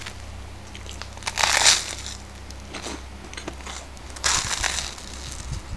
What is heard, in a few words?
eat